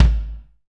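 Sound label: bass drum, Roland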